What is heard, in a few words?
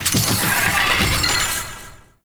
Ship Servo Mech; Warframe; Corpus Ship Remaster